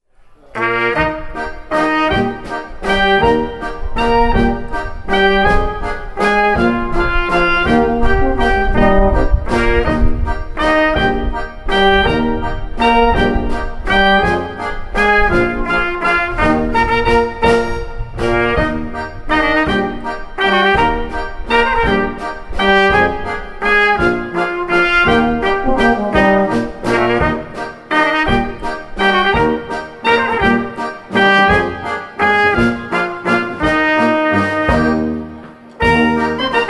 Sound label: waltz medley live